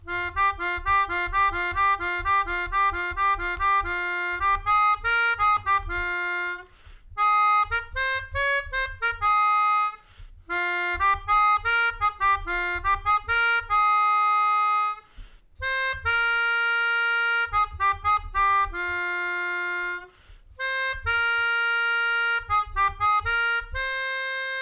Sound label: Melodica